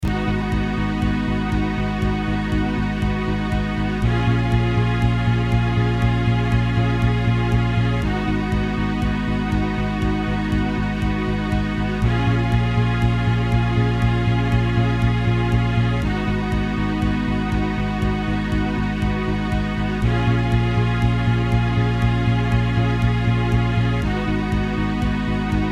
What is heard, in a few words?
mazurka